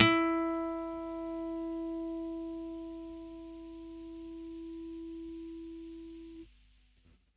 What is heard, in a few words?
A7 fret, acoustic guitar harmonics